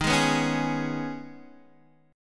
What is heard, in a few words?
D sharp 7th Suspended 4th Sharp 5th, Guitar, DADGAD